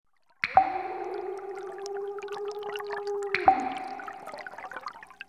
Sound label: Pool & Fire